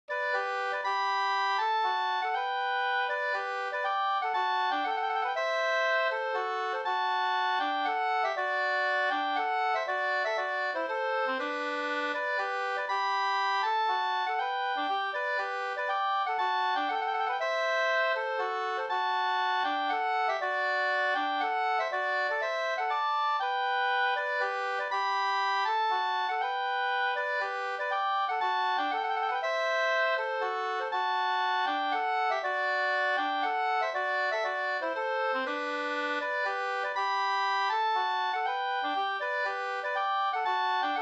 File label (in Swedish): polska Bodapolska